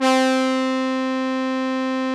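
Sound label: Juno brass, analog synths; 90s Sample CDs; Club-50 - Foundations Roland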